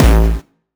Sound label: Distortion Kicks, Bassdrums; Vengeance; Essential Clubsounds Vol.4